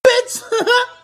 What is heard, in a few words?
huh huh